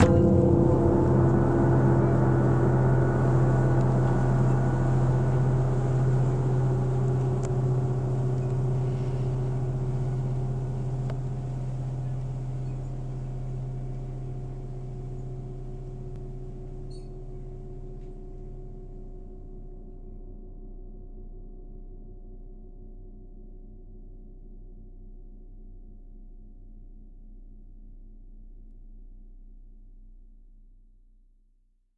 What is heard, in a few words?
HUGE GONG